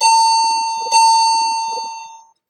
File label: chime down, elevator parts